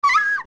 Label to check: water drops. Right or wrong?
Wrong